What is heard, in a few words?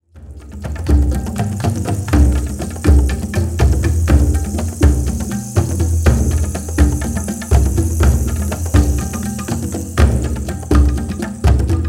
Fire Drums